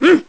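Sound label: Salute, gameplay sounds; Link saluting (when a train departs); zelda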